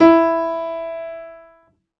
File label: Piano, Harmonic